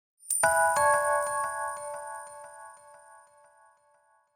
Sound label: gold medal acquisition jingle